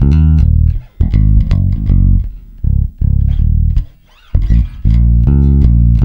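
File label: shuffle D#, bass; Spectrasonics; Bass Legends; SHUFFIT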